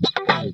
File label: SWOOP, Funk Guitar; 90 s Sample CDs; Zero G